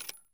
sv98 round in; Escape from Tarkov

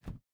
footstep rubber boots wood jump start; Rust

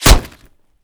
impact helmet; Escape from Tarkov

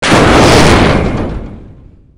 crash, damage; DCS

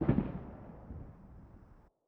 survey charge explosion distant; Rust

